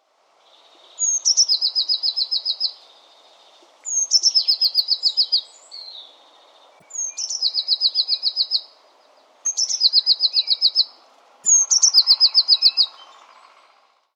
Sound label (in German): Blaumeise; Jacobo Ramil MIllarengo